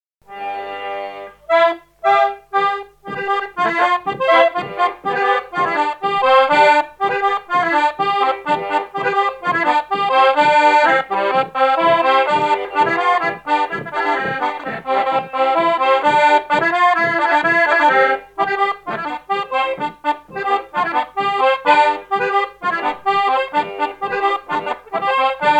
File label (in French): Barrada; En Clau Sautière